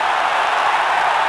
Amb Crowd; Cars; XBOX

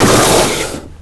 burst; WhiteOut

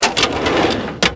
metal drawer open; Max Payne

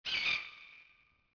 hawk; Ambient; MX vs ATV Unleashed

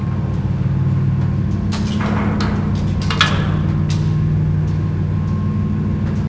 lift hum loop; Max Payne

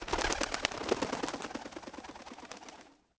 birds up, jungle; AMBIENT; Pirates Of The Caribbean

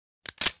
snipe reload; weapons; tusken

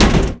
bouncy ashtray hit; bouncy objects; Tony Hawk's Project 8; XBOX

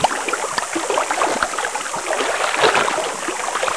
lava, ambience; Star Wars Jedi Knight II Jedi Outcast; XBOX; artus

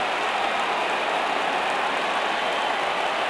Crowd; MX Unleashed

